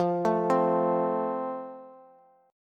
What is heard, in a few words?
F Major, Bass; arpeggiated